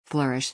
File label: flourish